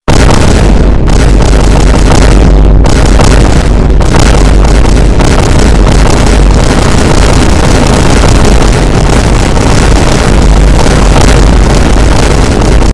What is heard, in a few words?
vine boom sound effect; Spamming